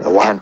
LoFi Vocals Oneshots; Vengeance Samples

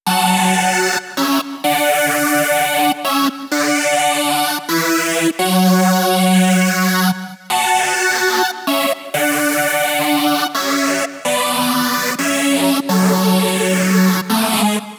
Male Pad; 128 BPM; Vengeance Samples; VTDS2 Song Kits

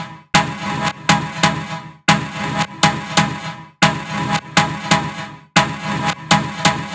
Special Sounds Root C# 138 BPM; vengeance essential club sounds-3

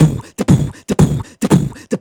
Beatbox Loops, Human Beatbox

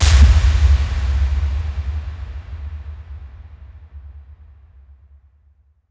Impacts

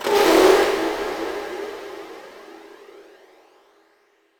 VFX Atmos

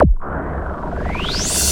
Short Fills & Reverse; Vengeance Samples

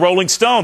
Vocal Phrases; Vengeance Samples; Vocals

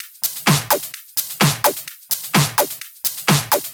Houseloops 128BPM; Vengeance Samples

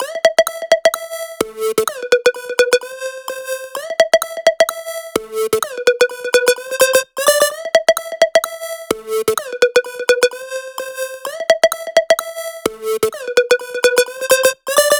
128BPM Melody; Vengeance Samples; Streetfight Kit

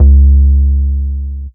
Vengeance Ultimate Bass C#, Root C#; Vengeance Samples

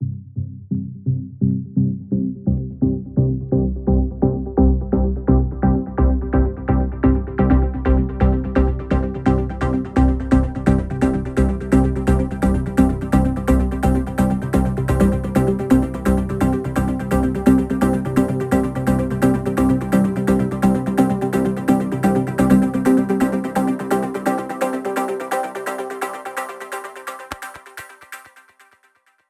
128BPM Non Flat Syn C, Melody Fills; 16Bars; Vengeance Samples

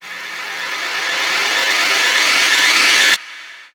FX Uplifter

